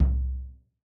bassdrum oh, gretsch kit

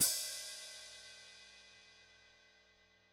hihat splash, gretsch kit

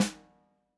snare, gretsch kit

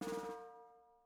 snare drag, gretsch kit

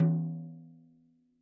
tom hi, gretsch kit

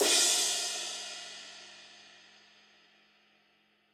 hi ride, gretsch kit

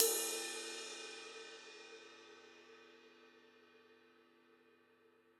ride, gretsch kit

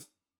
hihat foot, gretsch kit

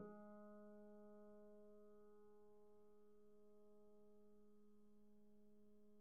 piano pp, steinway

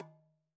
Udu Skin

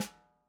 snare, gretsch kit

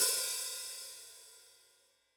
hihat open, gretsch kit

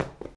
footstep

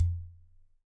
Udu Low

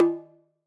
Udu Skin

